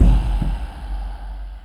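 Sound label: Music Shield; From Dust; PS3; Xbox 360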